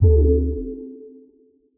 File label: other user leaves; Ubisoft